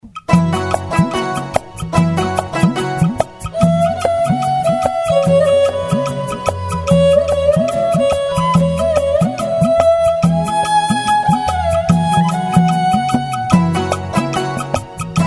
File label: music